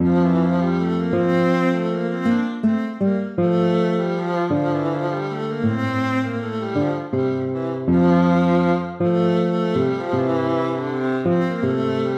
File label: piece for lute